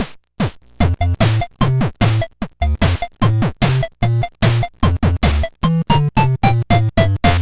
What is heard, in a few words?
Game Over; "Game Over" jingle